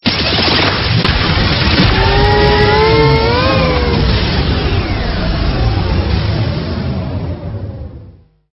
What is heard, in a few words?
Stinger; STINGERS